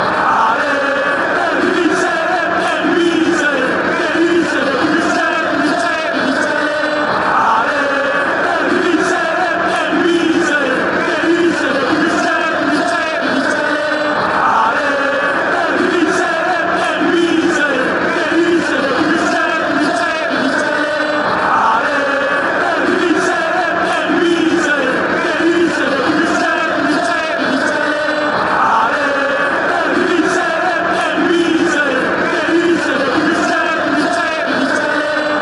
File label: you must win fanchants